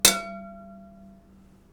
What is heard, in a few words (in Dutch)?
Metaal